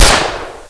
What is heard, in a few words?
gatling fire, weapons